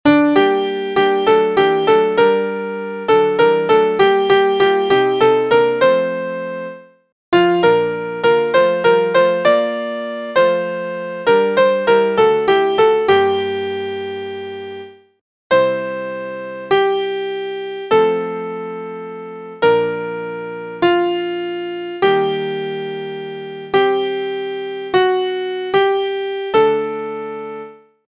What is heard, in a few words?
Piano; Allez par toute la terre